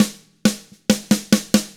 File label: SLOW ROCK, Drums; 66SLOWROCK